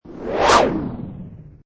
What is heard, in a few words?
Swish, Servo Panel Sound; Swish 2; Buttons